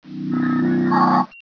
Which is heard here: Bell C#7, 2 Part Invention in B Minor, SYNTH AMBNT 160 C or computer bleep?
computer bleep